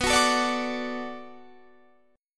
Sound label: D Major B, guitar; D/B strummed